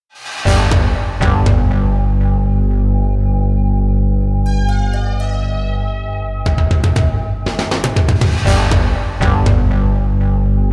Music One Shot

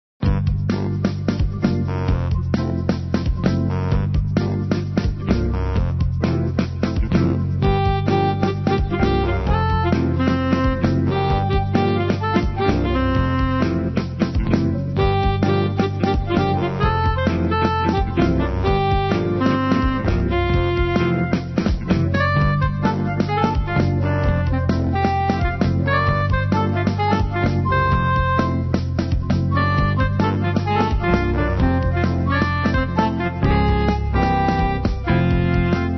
Jazz; Puffle Party 2013; O Berry; club penguin fun event trilogy

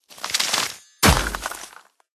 Druid Death; Barbarians

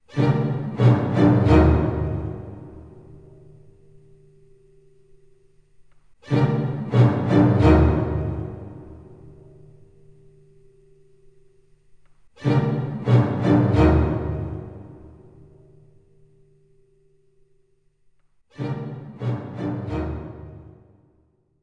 Stingers Fail; Night at the Museum Battle of the Smithsonian; wii; xbox 360

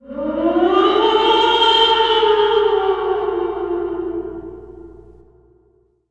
Banshee; Nicktoons Nitro; arcade

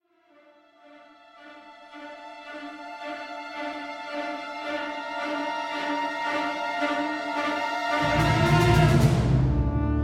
String; assassin s creed unity gamerip 2014

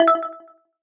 SFX Wooden Pickup; mobile

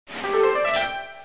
Conveyor; School; Fisher Price Ready for School Kindergarten

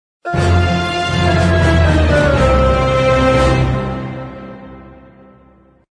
BGM Lose; Junior Three Kingdoms; android

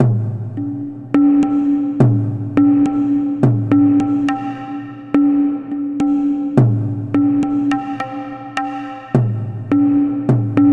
Smog Drums

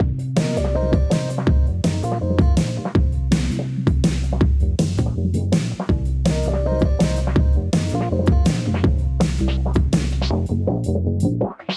DnB Test Song no crash; hot lava; ios macos windows

